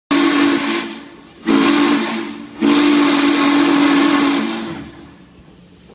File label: WHISTLE RINGTONE